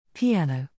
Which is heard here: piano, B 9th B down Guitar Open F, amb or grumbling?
piano